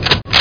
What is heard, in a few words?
reload